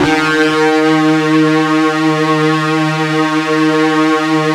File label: FUZZ D#2, Guitar; East West; Steve Stevens Guitar Samples Collection